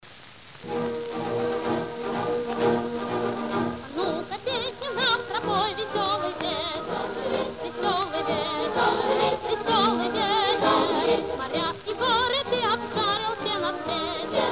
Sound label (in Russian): Детский хор и Оркестр студии